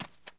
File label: run r, dirt foot; The Elder Scrolls III Morrowind GOTY; XBOX